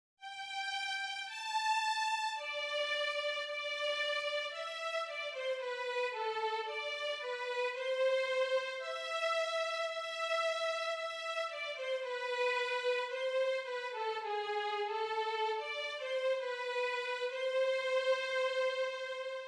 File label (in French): VIOLON; DOXOLOGIE solennelle